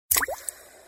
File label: drop